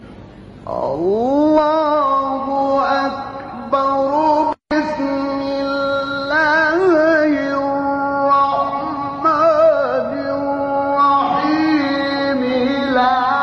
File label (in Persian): تلاوت فراز ابتدایی سوره مبارکه; بلد; محمود صدیق منشاوی